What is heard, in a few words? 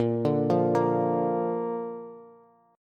Bb Major, Bass; Bb arpeggiated; 18,17,15,15; JGuitar